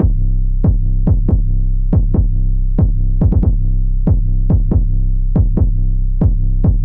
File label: 808 Bass C 70 bpm, Trap Drums; Surge; Trap Drums by Sound Oracle; Ableton; Factory Packs